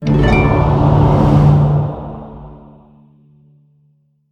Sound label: lyre strum; smite; Apollo